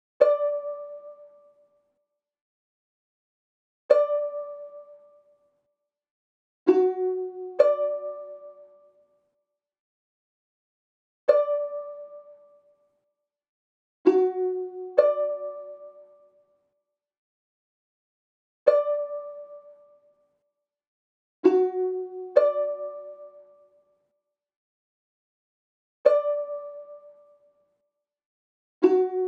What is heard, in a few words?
130 BPM B Min Pluck; Cymatics; 2022 Melody Mystery Expansion; Indigo